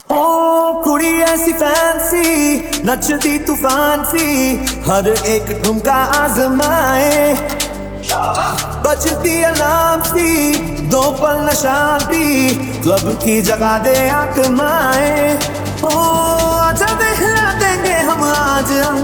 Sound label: DISCO